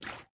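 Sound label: water run right, footsteps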